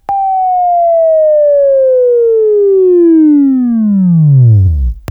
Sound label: Buzz; Sweeping Training